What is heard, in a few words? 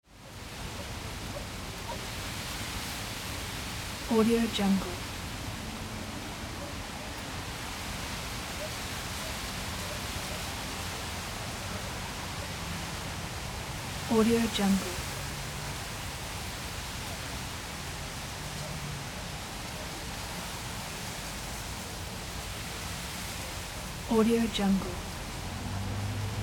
wind in the reeds on a river with city ambience